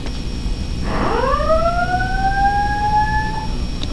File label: bullhorn